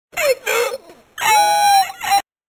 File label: issou 8 bits